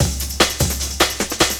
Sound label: LOOP, Drum & Bass, 150 BPM